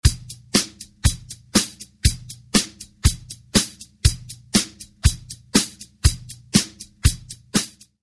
straight rhythm, A basic 1-2-3-4 It's simple and would get boring before too long